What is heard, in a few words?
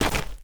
dirt land, footsteps; chief